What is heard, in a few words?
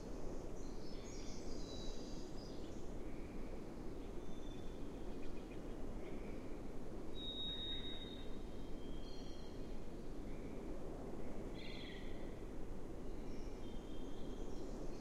amb combat; zeffira